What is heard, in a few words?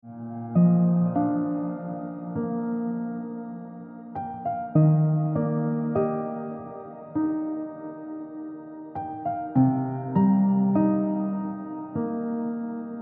Piano Music